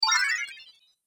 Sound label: Game Magic Exit; video game